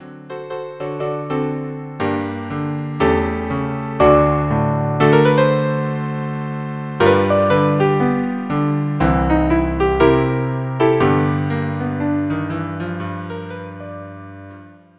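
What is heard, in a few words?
Blues string quartet; St Louis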